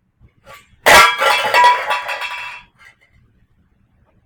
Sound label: Cans Crash